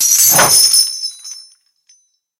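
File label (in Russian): звон упавшего со стола небольшого предмета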